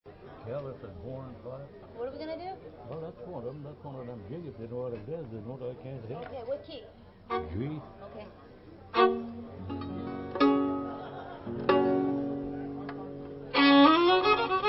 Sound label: hornpipe g